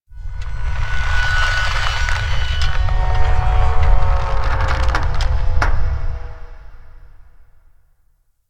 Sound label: Asteroid Low Creaks; Ambience; Warframe; Grineer Asteroid